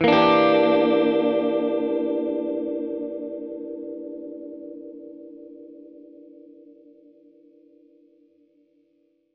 Gretsch Chord E7b5, Guitar Chords; undercover samples

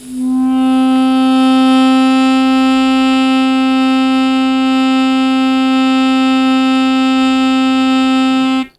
Long Sustain Aa C1, Harmonica; Zero G - Harmonica

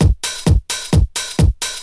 drum 130 straight; Techno Trance Essentials

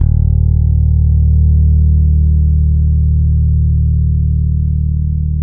SOUL, Bass, E 2; Spectrasonics; Bass Legends

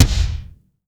POWR BD, POWER DRUM; AKAI S6000 CD-ROM - Volume 1; DRUM RYTHM

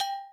percussion, Millenium MPS-400

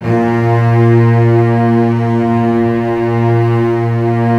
STR SEC, STRINGS; Roland - LA Composer vol. 1

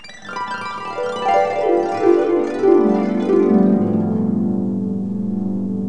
HARP GLISS

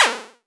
Tempest Analog Snare, Drum Machines; 300 Drum Machines; Dave Smith Instruments

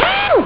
ssss; robocop; ST-XX Amiga Soundtracker Sample Packs

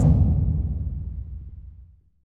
WATER TANK, Impacts, Hits & Booms; Distored Reality 1&2

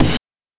BD + CRASH; NES DPCM; MIDINES

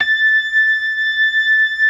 LOW MAN, LOWER MANUAL, Organ

